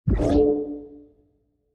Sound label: Training Ring Player Through; Sports